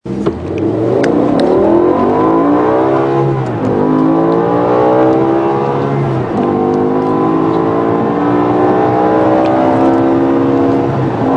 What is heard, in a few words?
BMW M5 E60 V10 sound